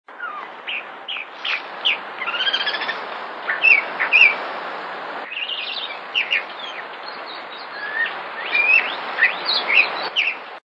voz tordo músico, Agelaioides badius

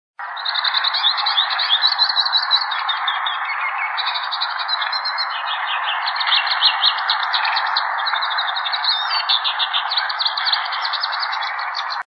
voz cabecitanegra común

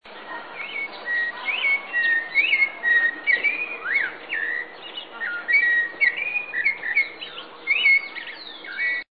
voz zorzal colorado

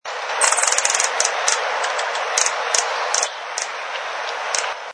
voz picaflor bronceado

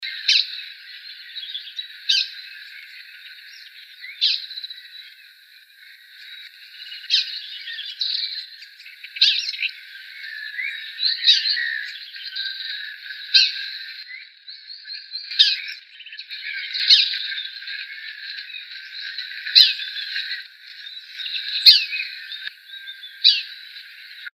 voz cardenilla

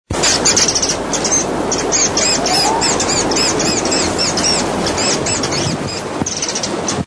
voz curutié ocráceo

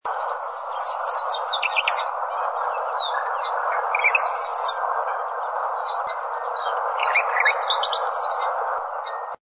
tordo renegrido, voz

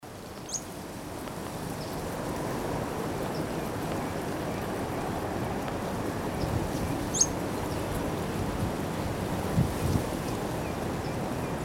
canastero coludo

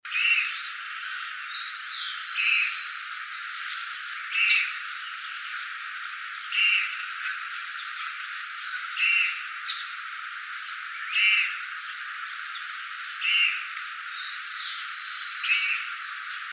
Suiriri suiriri

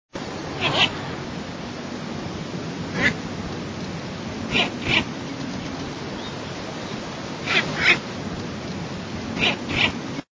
voz cuervillo cañada

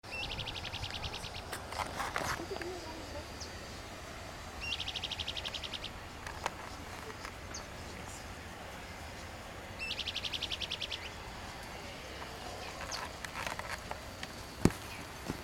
pijuí plomizo

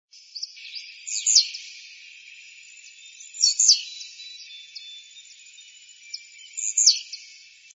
volatinero

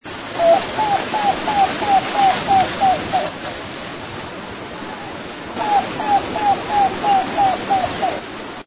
voz cuclillo canela

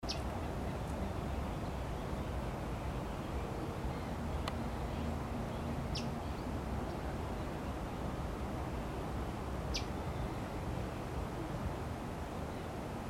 fueguero común voz

